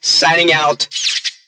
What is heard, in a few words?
death; Awesomenauts